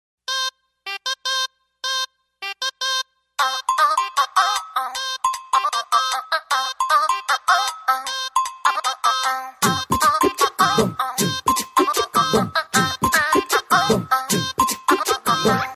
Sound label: Ringtone, Telugu; Sarileru Neekevvaru